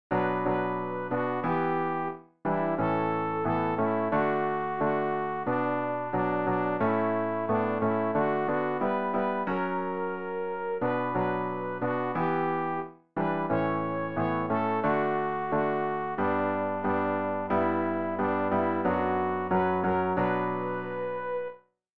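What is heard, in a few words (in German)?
sopran, Übehilfen für das Erlernen von Liedern; ref gesangbuch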